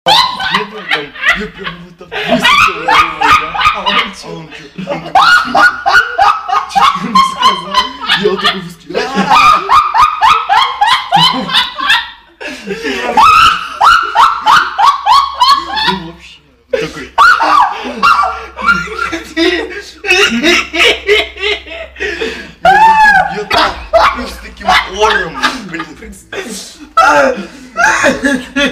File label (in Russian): Zvuki smeha, Istericheskij smeh